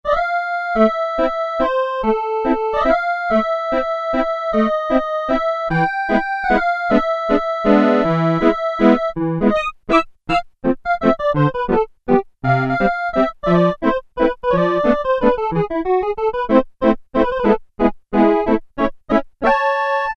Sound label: Korg Poly-800, Accordion